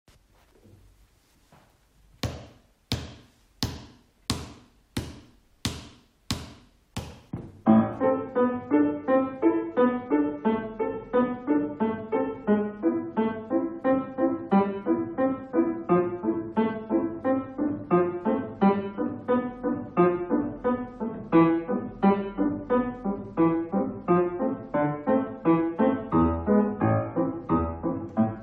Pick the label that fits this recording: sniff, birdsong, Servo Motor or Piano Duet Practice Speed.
Piano Duet Practice Speed